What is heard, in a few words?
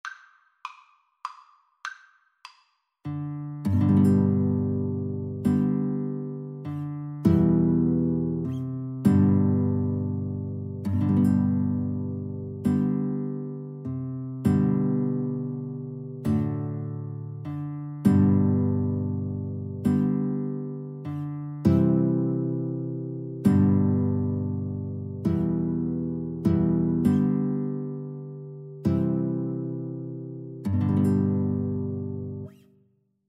Guitar Cello Duet